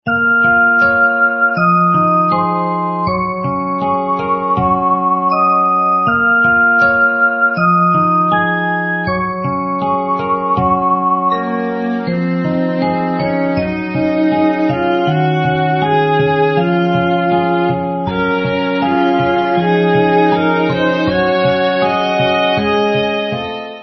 Sad (burned village & game over); Mystic Chronicles; psp

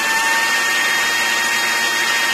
attack

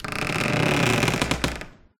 door creak; ProSoundCollection v1 3 MONO

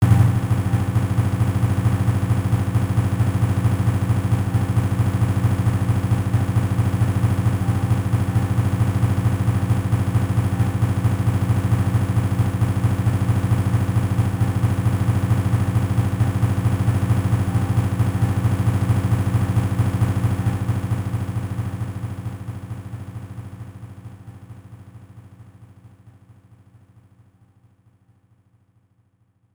Timpani Roll; mega system 32; hayaoshi quiz nettou namahousou; jaleco; arcade

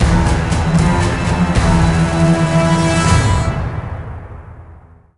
Sting Combat Lose fight; percy jackson and the olympians the lightning thief 2010 nds gamerip

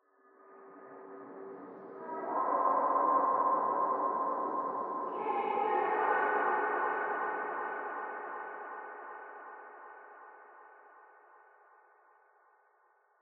Interior Dwb Ghosts

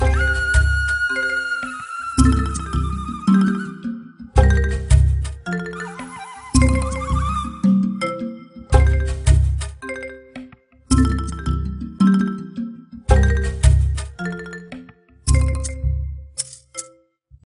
Jungle Level

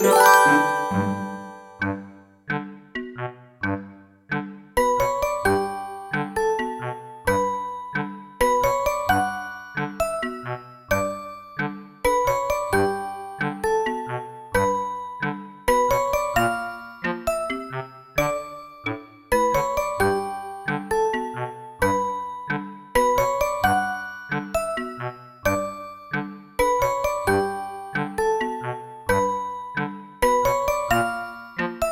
Medium Score (Handbell Harmony)

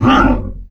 Monster Big Animal type Death; Inotia 3

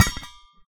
metal small impact shake; ProSoundCollection v1 3 MONO Metal

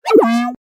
Bad Answer

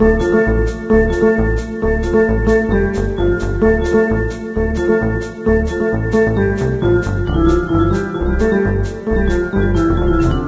Spaceship Music; Diner Dash Flo on the Go; NDS

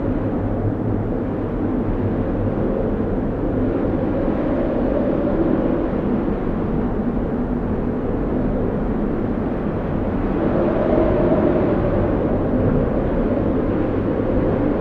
Wind canyon

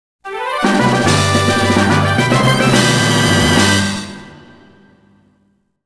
Fanfare - Winning

